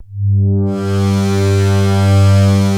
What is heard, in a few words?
RM19 FILTER G1, BASS; Best Service Dream Experience